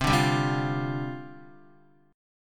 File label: C Suspended 2nd Suspended 4th, down, Guitar; Csus2sus4 strummed